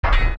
Step; Iron Knuckle; zelda; OOT

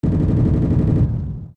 Ceiling Fall; Forest Temple

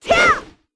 Sword Spin Stereo; Link's sword-spin attack (stereo versions); The Wind Waker

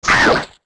Hit; Wizrobe getting hit

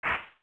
Jump

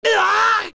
Fall Down; Adult Link

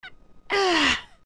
Yawn; Adult Link

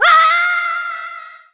Fall; Link falling off a platform

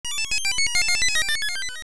Kinstone Descend; Kinstone descending after fusion

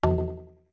Notes Drums F short; Goron Drums (short)

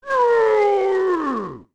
Roar; Gabora (of Mountain Smithy): "Roar!!"

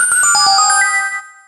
Transform; Zelda; Sheik

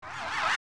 Retreat; Big Poe; Zelda; OOT

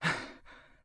Huff; Link huffing when low on health

